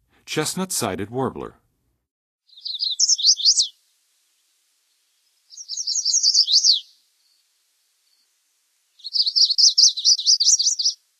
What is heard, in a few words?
Chestnut-sided Warbler, Songs of Birds of North America; Western USA; Western USA Songbirds